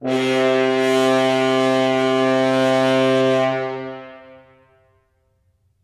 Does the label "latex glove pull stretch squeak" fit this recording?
No